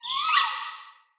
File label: Vocal Boost, Animals; Player; Player Floppet